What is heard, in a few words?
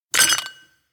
toast glass clink; anemoi